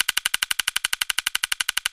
counter loop; Magic Stars 5; Wazdan

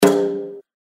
hit; Triple Dragons

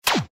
reel spin click; Robots

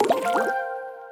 win multiline; Hungry Shark; Wazdan

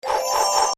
UI dice show; Paradise

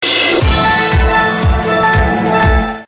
win; Flying Dutchman

